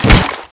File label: chainsaw slash, weapons